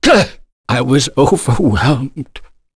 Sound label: Vox Dead; Nicx; kingsraid